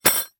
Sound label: Metal Stakes Dropping On Dirt; Sports Stake